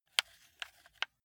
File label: console controller joystick move sequence short